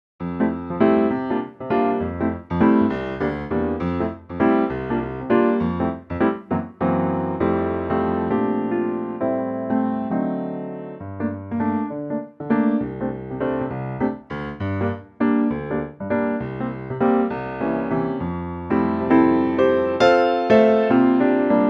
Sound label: F piano